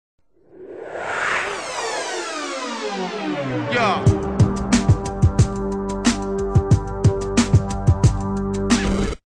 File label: hip hop